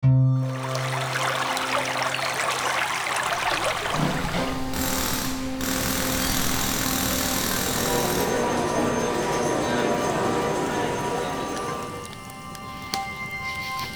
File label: Ambience; Sound Effects Bible Ambience